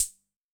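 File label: Wurlitzer Swinging Rhythm hi-hat closed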